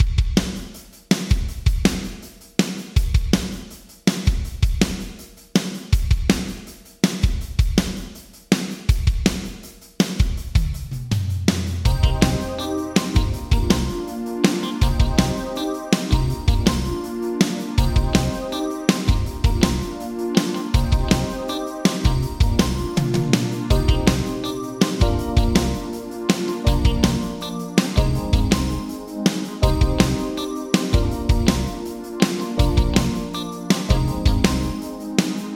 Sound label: Drums Yamaha ProR3 Medium Room 1 Vintage Digital